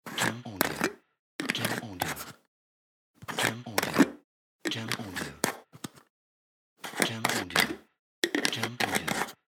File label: Bottle Plastic Lid Open